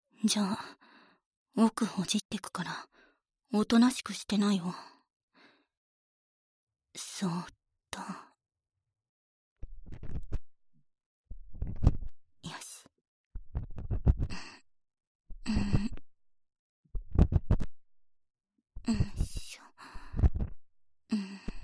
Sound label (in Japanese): 【右】奥耳かき; 陸上部の寡黙な先輩にお耳をひたすら弄られる